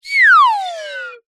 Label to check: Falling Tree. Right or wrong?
Wrong